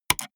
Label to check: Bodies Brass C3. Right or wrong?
Wrong